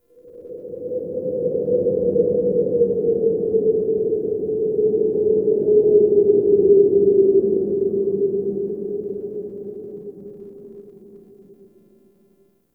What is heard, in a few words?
Interior Wind Howl; Ambience; Warframe; Duviri